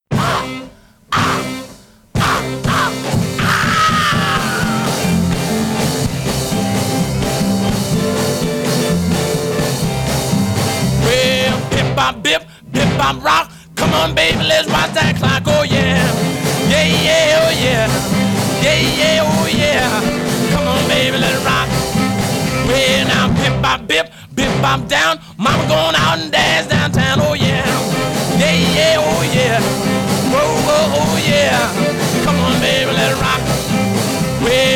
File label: rockabilly; Rock’n’Roll